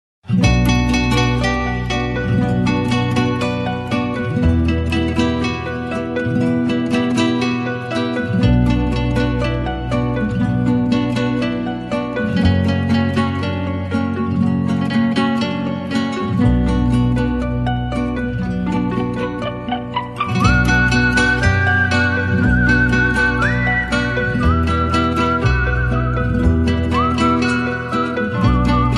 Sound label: Light Ringtone